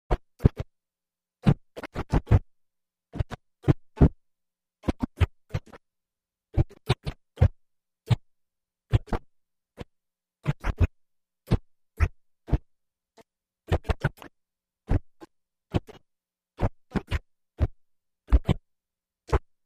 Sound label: space alien radio signal; radio signals